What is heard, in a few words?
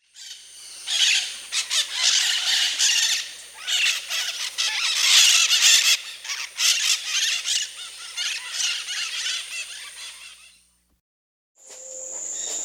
White-eyed Parakeet (Aratinga leucophthalmus), Peru; S. America